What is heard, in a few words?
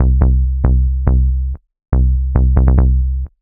ELECTRO BASS 140 A